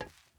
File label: glass step; teardown; ps5 windows xbox series xs